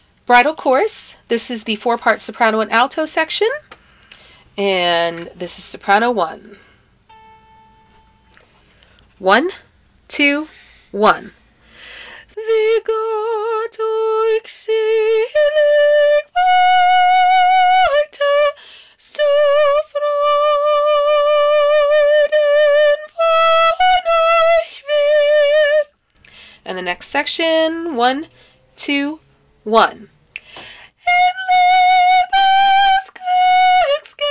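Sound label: bridal chorus soprano; study tracks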